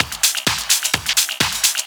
Clubhouse Loop 128 BPM

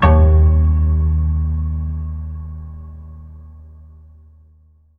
PIANO D#2; Best Service; Advanced Media Trax Modern Composer; PNO HAMON

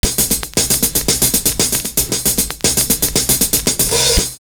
ONLY A HH, loop

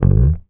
HOFNER, Bass

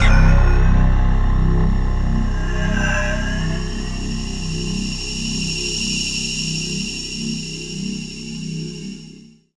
SYN DIGITAL, Roland; Sound & Vision - Gigapack I CD 2

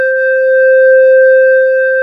TX FOREST C4, SYNTH; AKAI S-Series CD-ROM Sound Library VOL-2

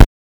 Moog BD; Moog Modular55

